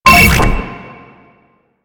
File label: Proto Infested Mutalist Big Land Scream; Warframe; Abilities